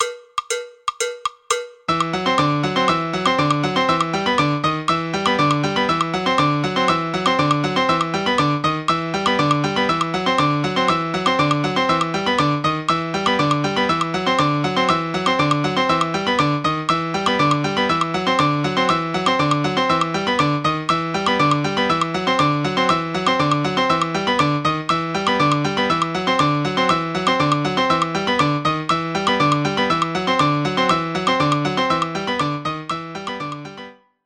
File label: tumbao fast